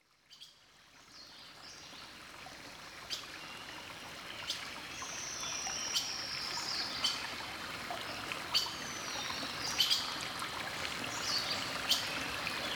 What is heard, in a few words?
Up In The Sky, звуки природи; Bird Loges